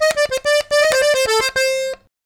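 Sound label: Accordion Lick, C; Zero-G Kocktail Kollection; Accordion Lix; 90 s Sample CDs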